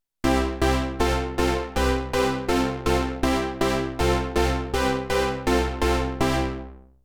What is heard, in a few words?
Synth Brass